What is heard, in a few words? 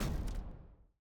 explosion plasma small; Mindustry